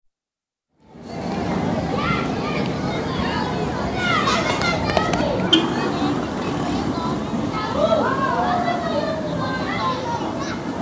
Spain: School, exterior, children playing at lunchtime, with fountain in distance & background traffic; BBC